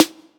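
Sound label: snare drum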